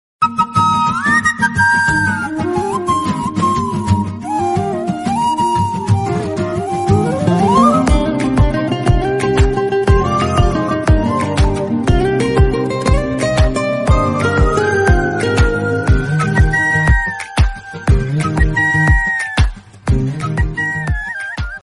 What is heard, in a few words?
love bgm ringtone telugu love bgm ringtone tamil ringtone; guna 369